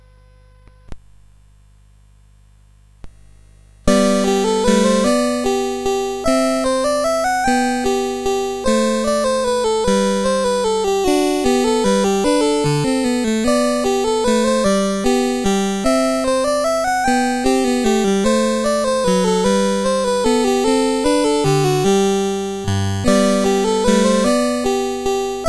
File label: Minuet in G, CSG8580R5